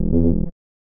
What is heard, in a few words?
Bass Stab